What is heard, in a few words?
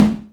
Tom 16 G